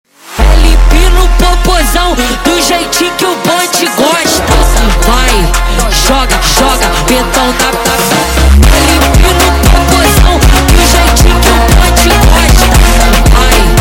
Brega sped Up; funk

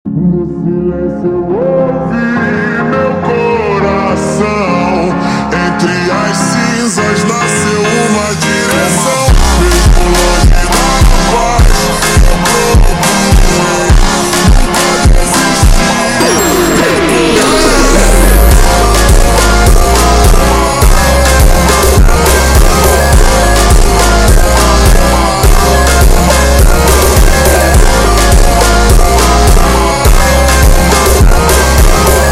super Slowed, funk

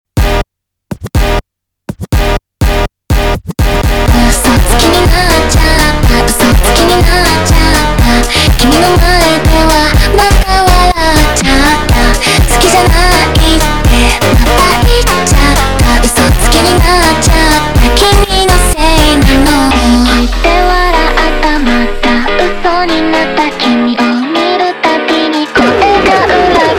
slowed, funk

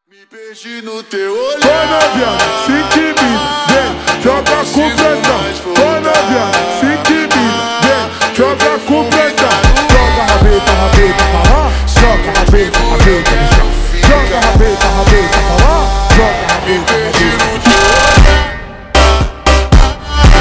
Funk slowed

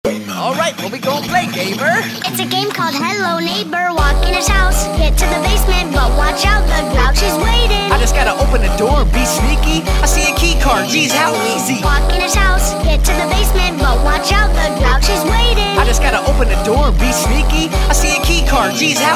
Funk, funk

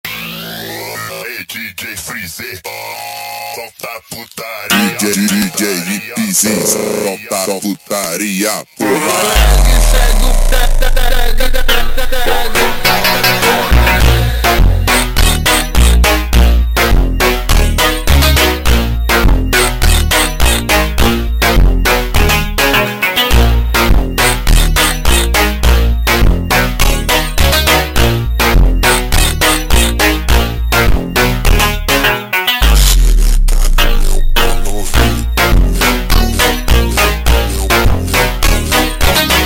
super Slowed, funk

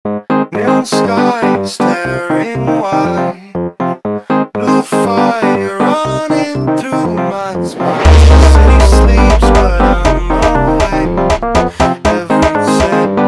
Golden Pulse Funk

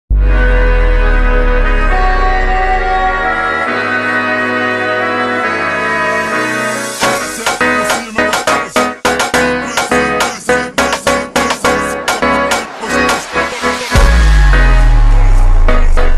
Funk Woodwind super Slowed

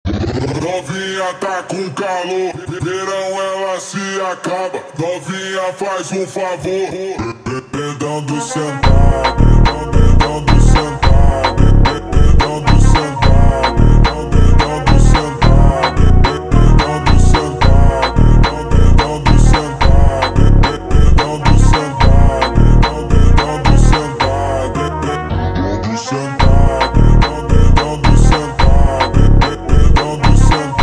slowed, funk

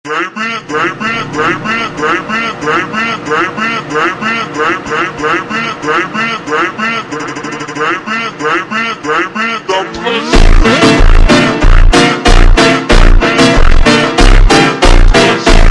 Ultra Slowed, funk

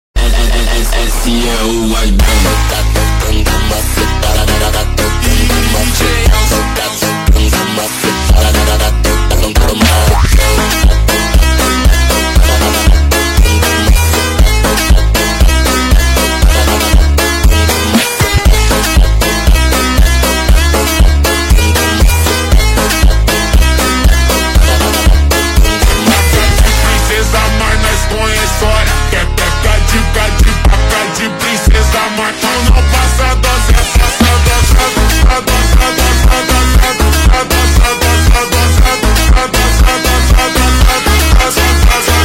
Funk slowed

